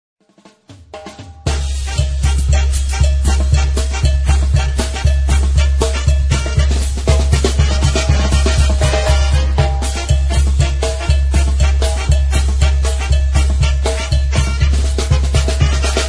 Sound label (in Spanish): palillos chinos tamborazo zacatecanos